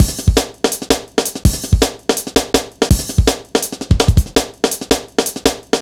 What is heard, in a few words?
165, Drum Loops, Drum & Bass; Total Drum&Bass